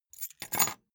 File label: key ring small place table